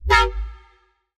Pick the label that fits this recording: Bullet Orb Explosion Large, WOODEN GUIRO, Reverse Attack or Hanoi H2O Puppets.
Reverse Attack